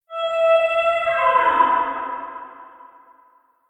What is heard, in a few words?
Prehistoric Bird Dinosaur Cry Out; Fantasy Vocal Scifi Science Fiction; Alien; Aliens (Sound Effects:Science Fiction)